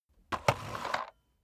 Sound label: vacuum sweep forward